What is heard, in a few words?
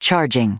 charge start; HA-MIC05R2